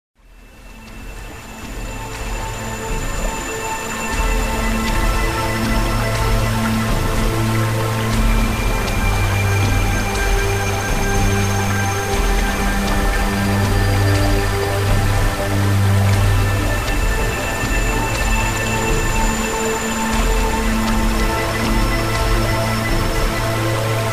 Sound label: white noise meditation 3 Hz delta